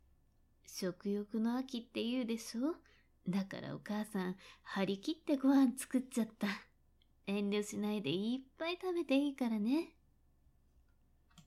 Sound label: haha